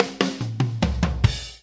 six eight Fill, DRUM LOOPS and BREAKS

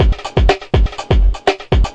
123 roll, drum loops and breaks; 121 to 130 bpm

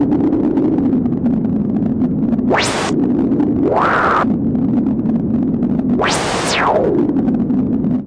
noise Modular, Noise Sweeps

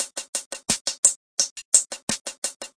172 hihat mellow, drum loops and breaks

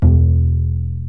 Plucked Bass E2, Upright Bass Plucks

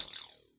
Hat, Cyborg Kit

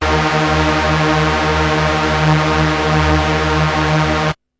bass D sharp 2; multisampled; must destroy you bass 1

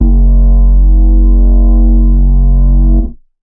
synth bass muted; multisampled; synth keys

